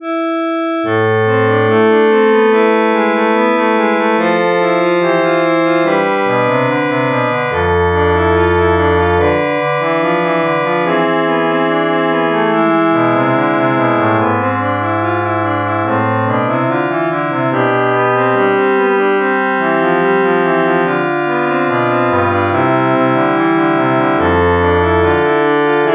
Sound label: Chorale Prelude